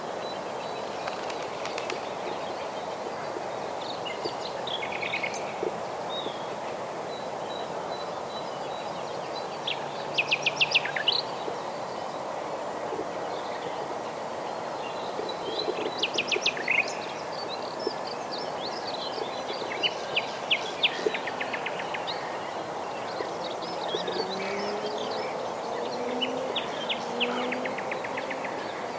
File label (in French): rossignol; haute ile